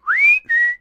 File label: Whistle Human; SFX Kit; Foley - Misc